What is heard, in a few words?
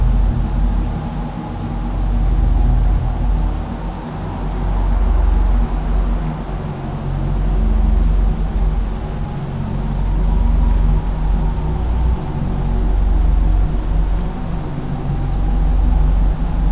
Enigma Ambience; OuterWildsModManager; OWML; AnonymousStrangerOW.TheStrangerTheyAre